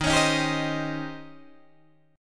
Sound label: Eb 13th, Guitar, Open D